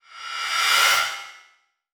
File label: Multimedia Internet CD-Rom Flash Wipe